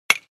Baseball hit aluminium bat

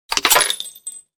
Bolt rifle shell ejection; assault rifle

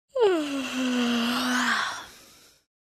Teen girl yawning

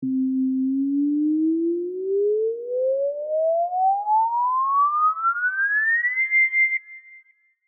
Cartoon ascending

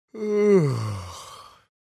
Middle aged male yawn sleepy

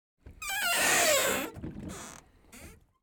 Boat interior door opening or closing

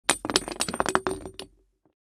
Grenade roll on hard surface; gfxsounds

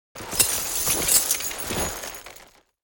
Medieval armor and leather uniform grabbing

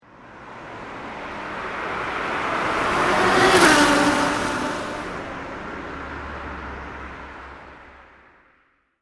Highway car passing by; vehicle

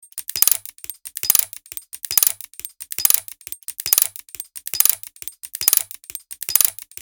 Small unusual clock mechanism loop; steampunk; gfxsounds

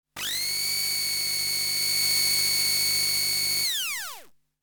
Grinder electromagnetic textures

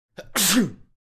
Young boy sneeze

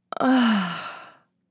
Young woman moan with relief

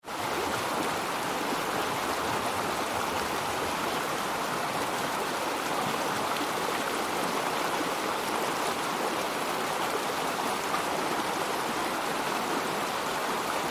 Colorado stony river with strong flowing water

